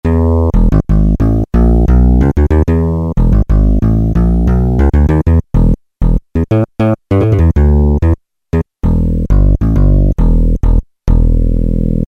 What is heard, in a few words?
Bass, Kawai K1m; IA-1 That Bass